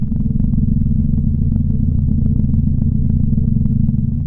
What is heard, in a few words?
engine, taxi